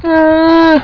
were pain, werewolf

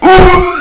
ooo

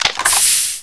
gas clip out

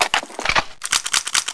special ammo

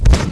alien firing, weapons

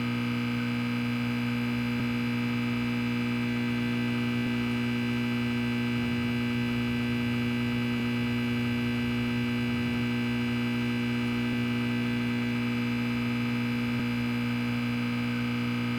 hum

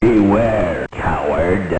alert

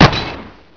gun; hassault

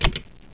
button press

